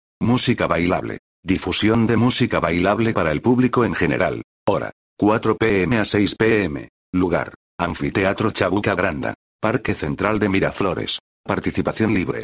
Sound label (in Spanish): musica bailable